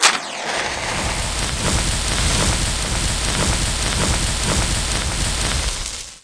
Flame; fun cube

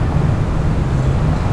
City, ambience

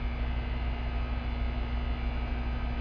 loading room ambient

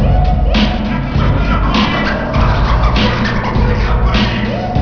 song

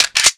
garand reload clip in; svencoop; ww2projekt

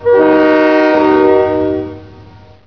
train

